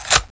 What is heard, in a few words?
m60e4 clip out; weapons; cstrike